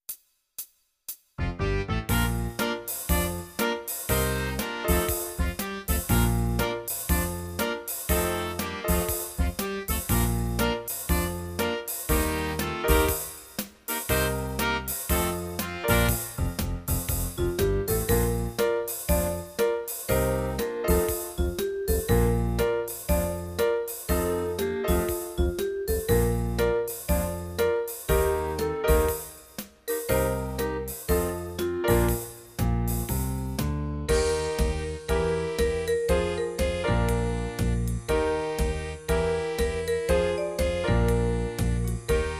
sax; dortoka alferra

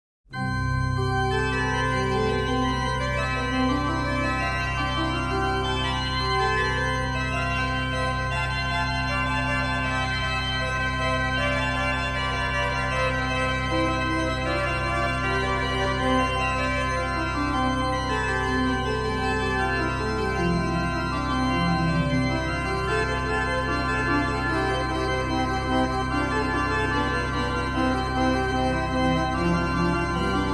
toccata; martini giovanni battista

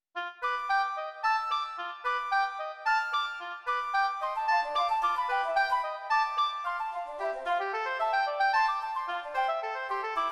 bagatelles animato

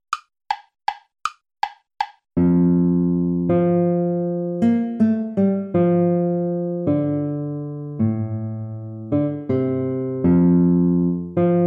piece for lute play along

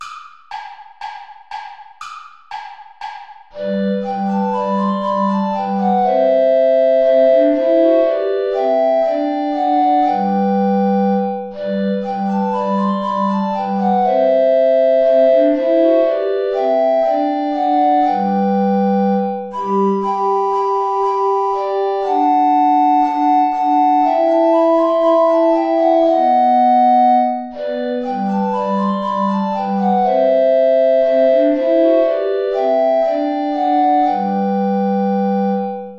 play along without tenor recorder; steuerlein johann; mit lieb bin ich umfangen

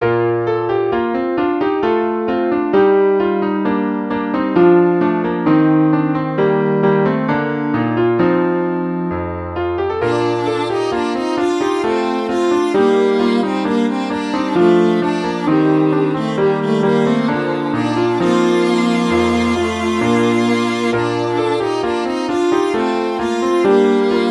hornpipe for viola piano; purcell henry; hole in the wall; from abdelazer suite

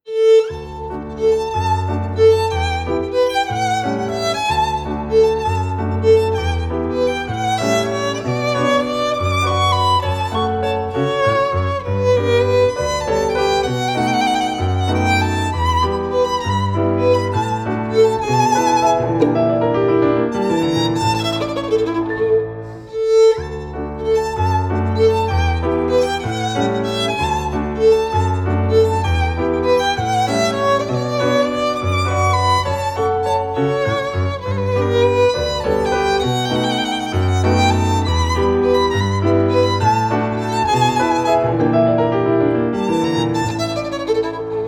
waltz for violin and piano; christmas

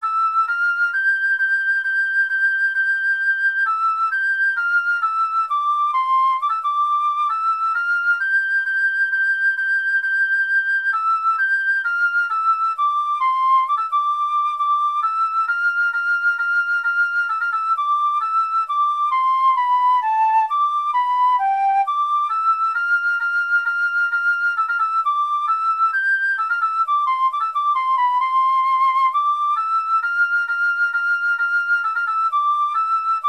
branle for woodwind quartet; hotteterre jacques martin; from la noce champetre

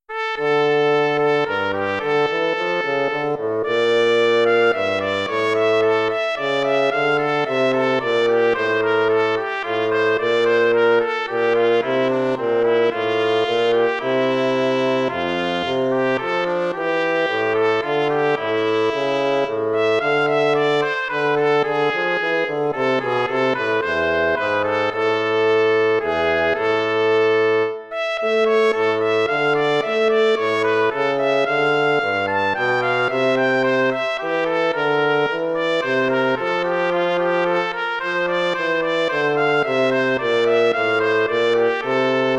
courante

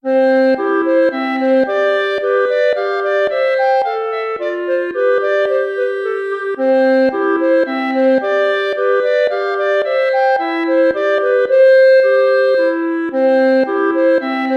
tempo minuetto; hook james

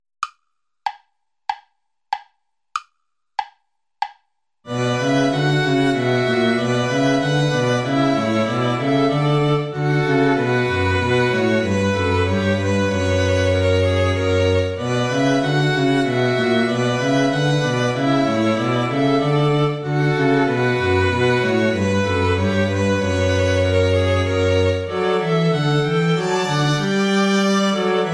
play along without violin; bach johann sebastian; ich steh deiner krippen hier

